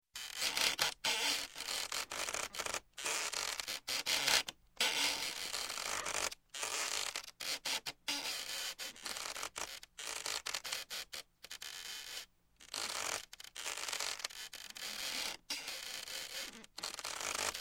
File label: Hospital O. R. Operation Ambience Voice Heart; Heart Monitor; Sound Ideas 2000 Series